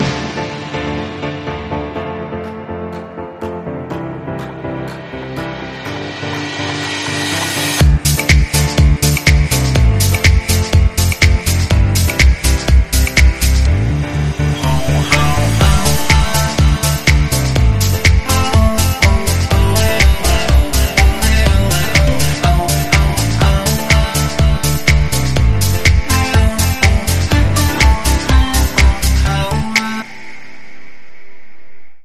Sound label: Deep Piano Love